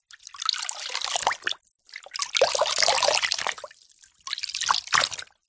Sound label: household cleaning cloth wet in and out gently of bucket of water